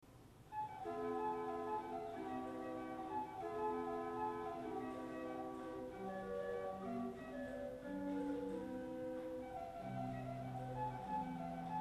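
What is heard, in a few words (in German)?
Lieblich Gedackt 8, Orgelstimmen; Orgelstimmen CD1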